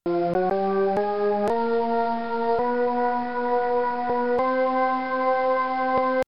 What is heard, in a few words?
Slider